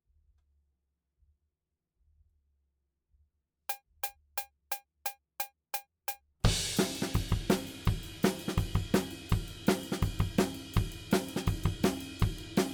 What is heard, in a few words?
Drums ONLY